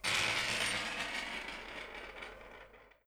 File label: plane